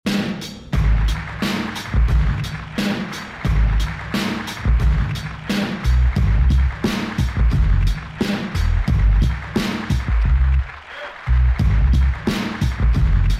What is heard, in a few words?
bonus beats